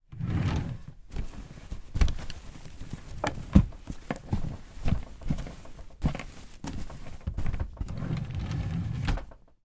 foley clothing drawer open rummage through clothing drawer shut